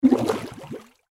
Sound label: Bubbles Surface, Water Bubbles Surface Gurgle; Water Bubbles Surface Human Exhale Breath Underwater Gurgle; Gargling; Blastwave